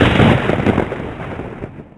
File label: thunder; bracken